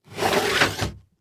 Foley Footstep Hard Sole Dress Shoe Scrape On Hollow Wood Surface With Bump; Foley Footsteps